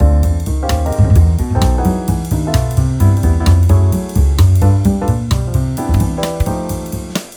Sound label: Bass